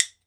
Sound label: WOOD BONE, Ireland